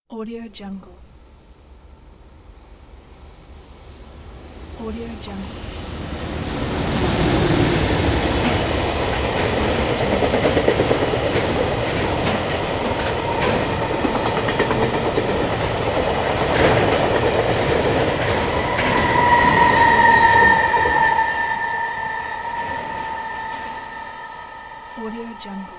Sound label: train